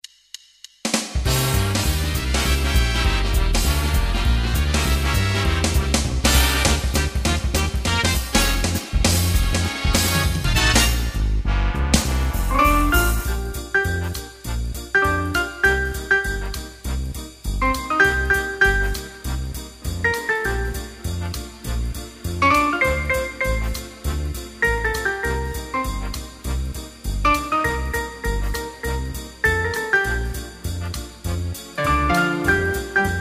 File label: piano